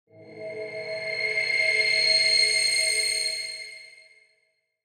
Whoosh Transition Sci Fi Musical, Whoosh Transition Musical Magical Transformation; Whooshes; Sound Design; BBFX